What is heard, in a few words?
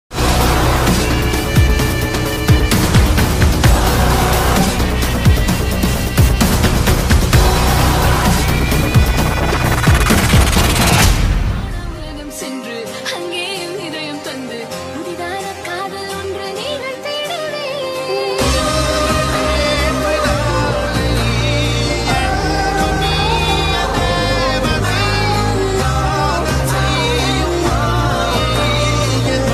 dj mix bgm